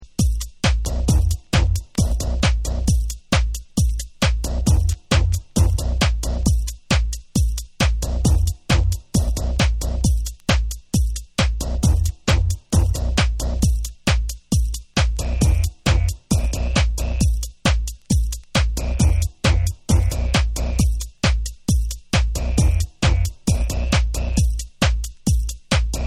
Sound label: Instrumental